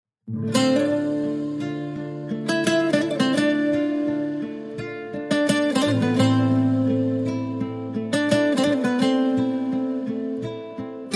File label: Guitar Version